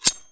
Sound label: knife deploy; czero opforce